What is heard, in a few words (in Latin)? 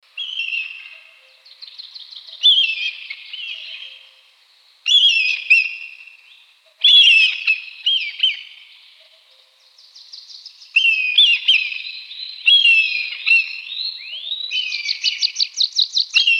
Milvus milvus